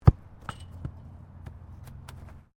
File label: SOCCER BALL INTO WIRE NET